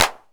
Clap, Jomox Xbase-09

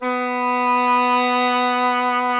E. Horn

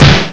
star snare; ST-XX Amiga Soundtracker Sample Packs

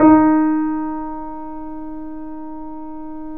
D#, The Piano; InVision Interactive - The Piano; BOESINGSOFT

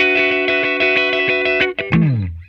JANGL, Funk Guitar; Zero G - Funk Guitar

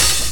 CHH, Dance Drums

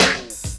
SD, Dance Drums

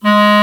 Clar mf G#2; Digital Sound Factory Virtuoso

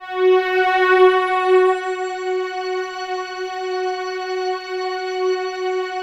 COSMIC, PADS; Masterbits; Vintage Voltage

